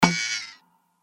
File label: Melodic off; WeMod